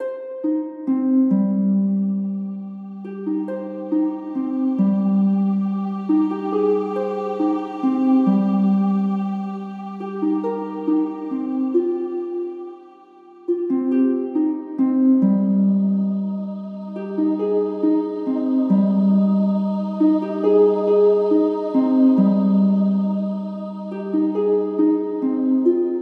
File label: heaven 69bpm